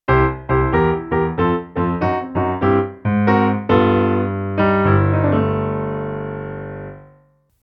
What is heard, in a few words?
Blues Introduction Key of C